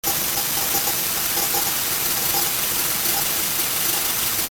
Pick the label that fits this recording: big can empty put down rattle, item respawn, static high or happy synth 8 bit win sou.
static high